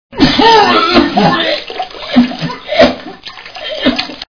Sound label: puke; geraeusche menschl